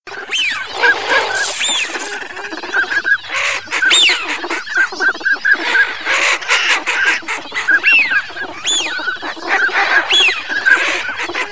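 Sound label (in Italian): codone codone femmina fischio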